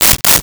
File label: Hydraulics, Mechanical